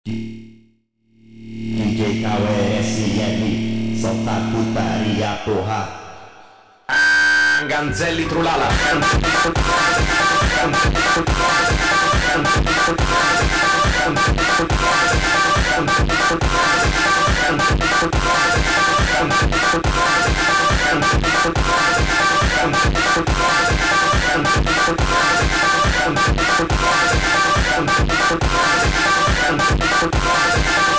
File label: FUNK 3d rotating